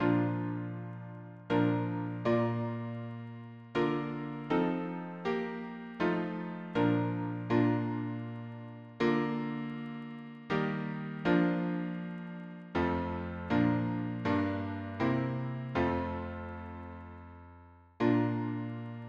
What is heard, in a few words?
harmony, piano